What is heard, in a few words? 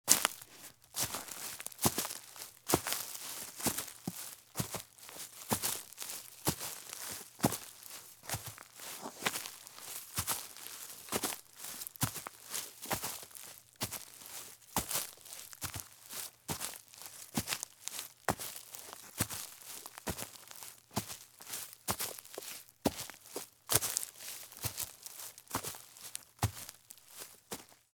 Running Shoes Walk at Medium Pace in Forest; Sound Ideas; Footstep Sound Effects